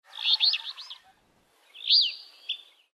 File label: flycatcher alder; species/birds; wbba